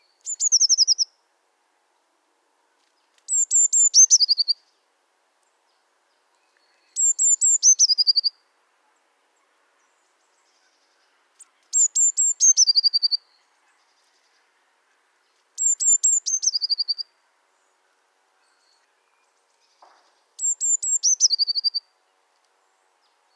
Mesange bleue

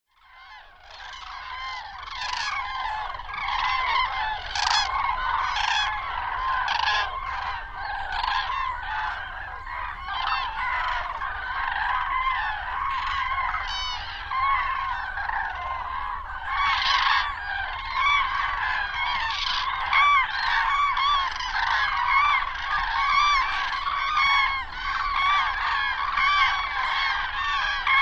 Grue cendree, chants oiseaux

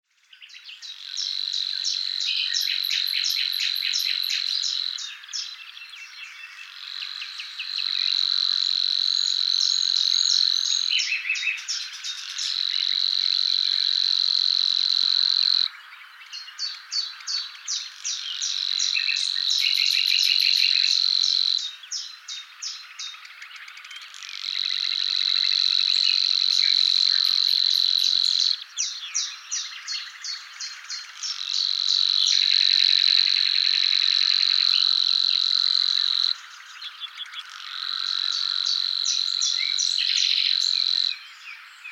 Locustelle luscinioide

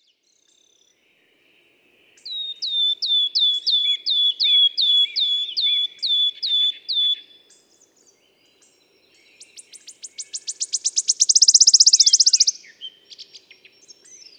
Pouillot siffleur

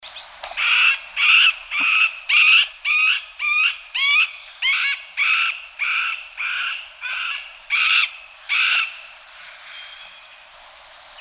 Pic mar

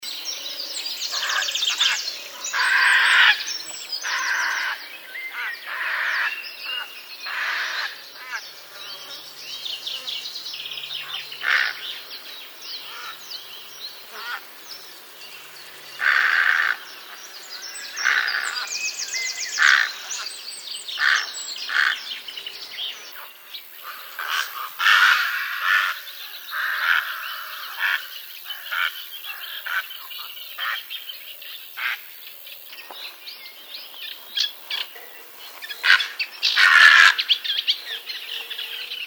Heron pourpre

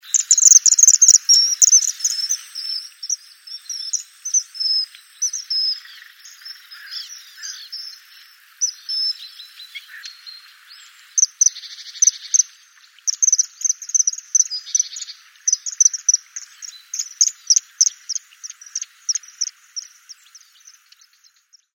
Martin pecheur

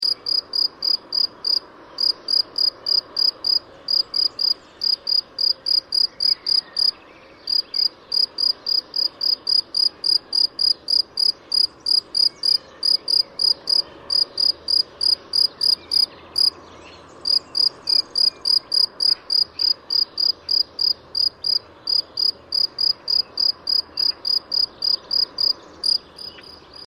Grillon des champs

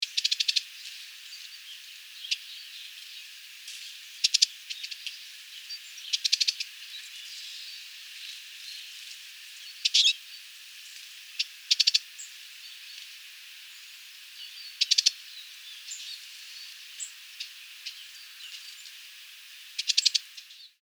Tarin des aulnes

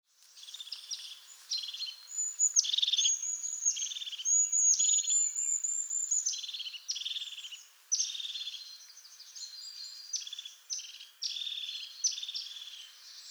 Mesange huppee

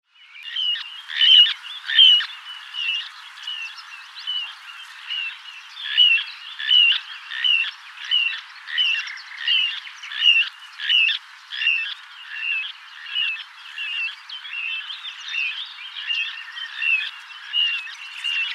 Petit gravelot

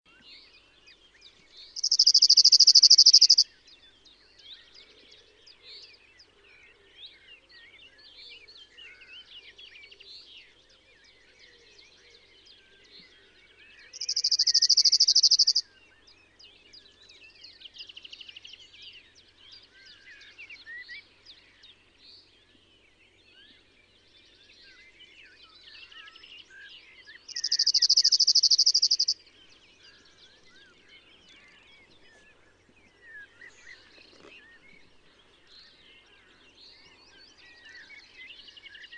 Bruant zizi, chants oiseaux

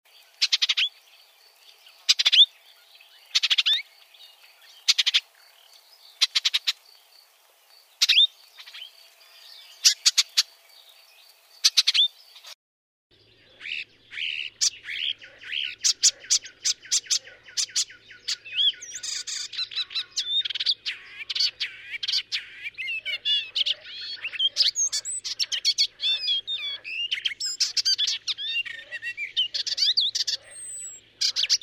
Hypolais icterine

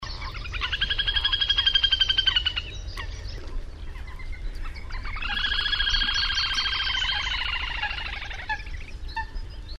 Grebe castagneux

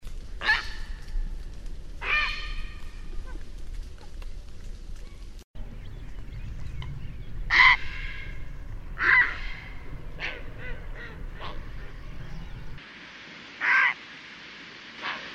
Heron cendre

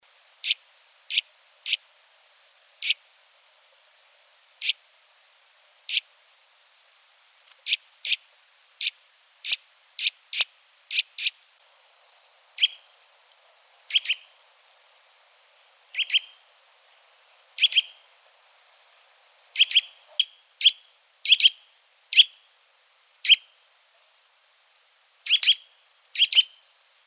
Sittelle torchepot

